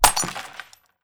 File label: small Glass TRINKL